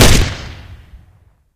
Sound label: grenade launcher fire; weapons; source engine; left4dead2